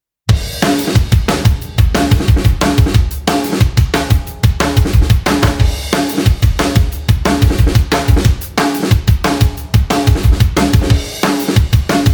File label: drum after